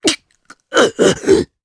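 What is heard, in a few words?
Vox Damage Japanese; Riheet; kingsraid audio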